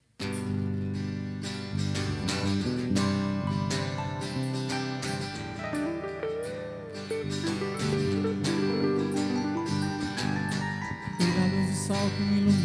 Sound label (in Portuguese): cantada